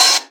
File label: Cymbals HH Open; Vengeance Samples